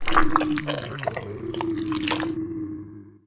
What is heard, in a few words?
flesh born; Emperor - Battle for Dune